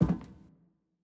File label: Footstep Metal